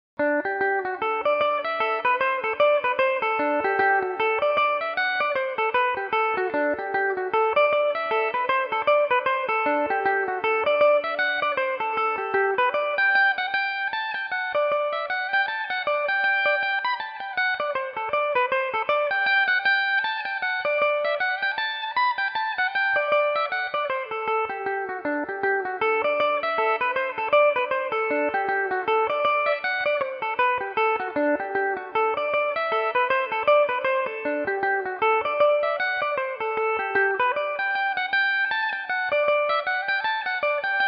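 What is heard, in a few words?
G major, Irish mandolin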